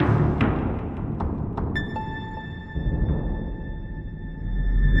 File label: Spooky Sting